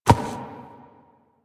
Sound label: Ram Step Walk Dirt; Animals; DE; Warframe; Quests; Duviri